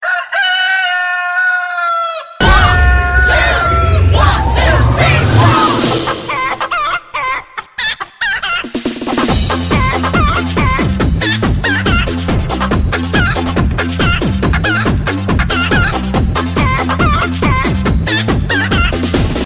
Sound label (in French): Funy Coq